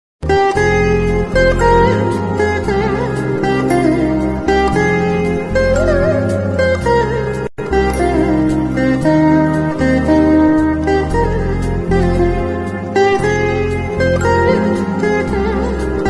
bgm ringtone telugu; teen maar

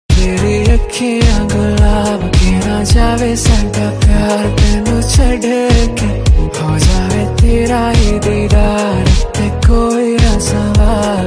bgm ringtone love ringtone; Kirti Sonon

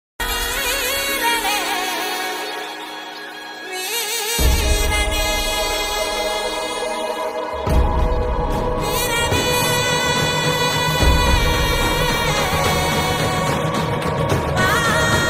trending bgm tamil; veeran

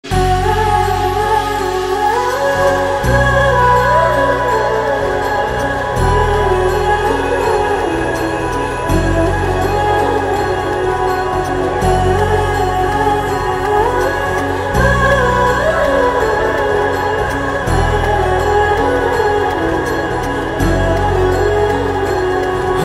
epic and powerful soundtrack; adhipurush movie

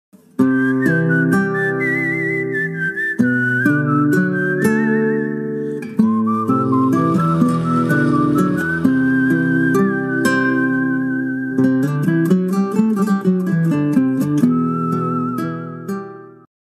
turkish ringtone

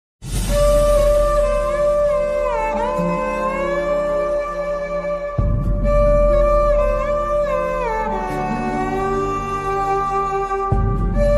movie bgm ringtone sad bgm ringtone telugu bgm; Jaya Janaki Nayaka; bellamkonda sreenivas; rakul preet

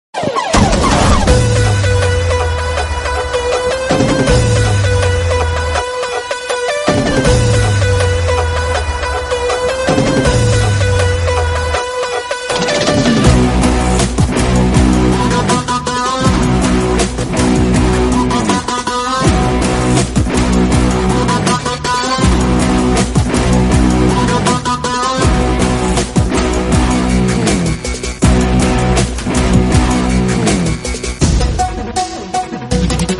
fight bgm music, telugu; telugu movie

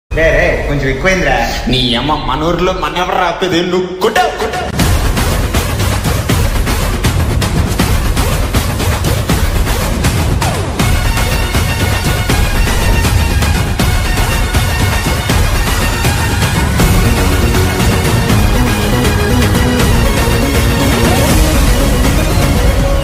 bgm background music; rangabali; naga shourya

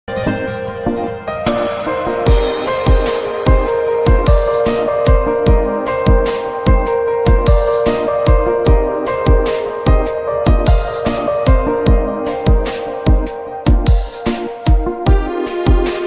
instrumental ringtone

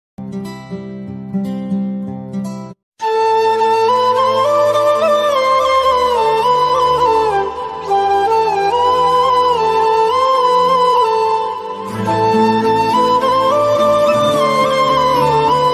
instrumental ringtone old hindi song ringtone

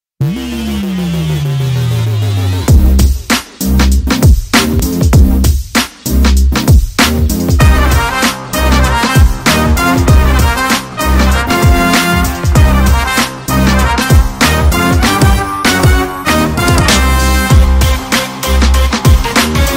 movie bgm beats telugu

